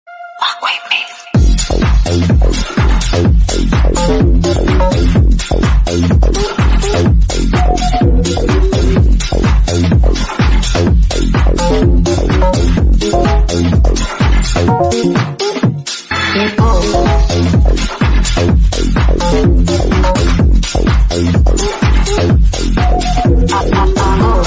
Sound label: Groove Basement Tech House Ringtone